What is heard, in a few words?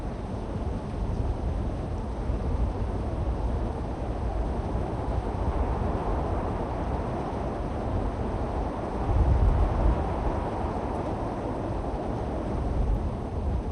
Wind Outside